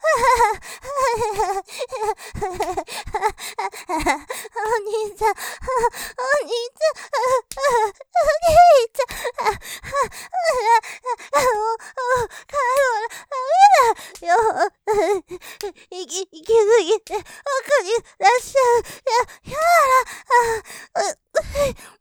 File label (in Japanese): 喘ぎ お兄ちゃん；行き過ぎてダメだよぉ; モノラル; ループで楽しむ！シェフの気まぐれ抜き抜きコース